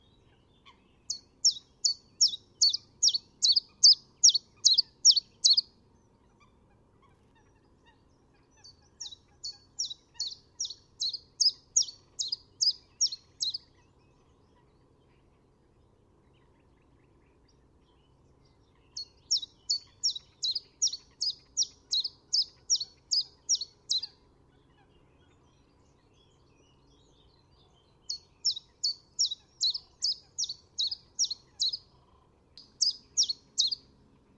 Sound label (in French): pouillot veloce